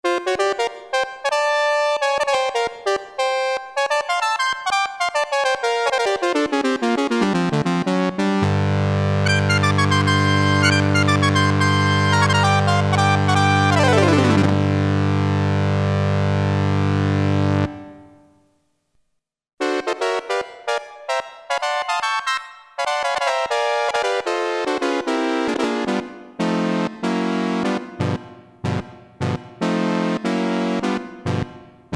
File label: HORN FIFTH, Roland Jupiter-8, Factory patches